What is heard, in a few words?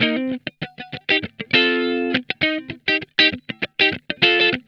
STUCK UP, Funk Guitar; Zero G - Funk Guitar